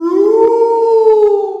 Wolf

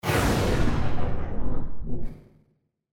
Slow Down; GGJ2022

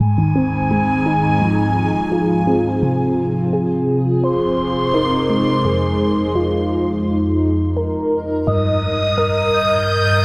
Morning Theme

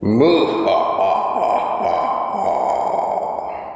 Moohahaha

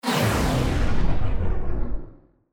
Slow Down; GGJ2022

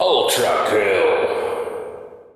Bonus Ultra Kill

